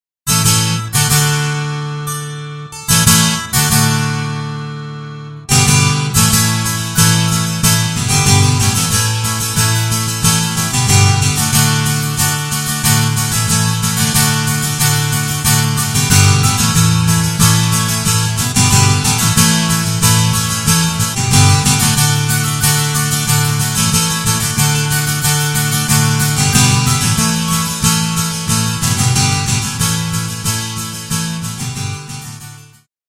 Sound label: Acoustic Twelve String Guitar; GuitarTempus; Syntheway